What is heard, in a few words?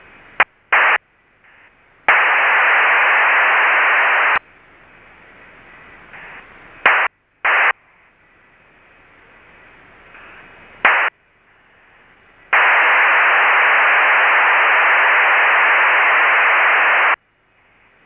RS PSK2400 var; Two stations exchanging traffic using the R&S 2400 Bd PSK waveform; ROHDE & SCHWARZ 2400 Bd; PSK-systems